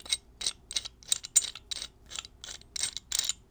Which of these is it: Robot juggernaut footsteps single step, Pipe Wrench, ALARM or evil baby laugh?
Pipe Wrench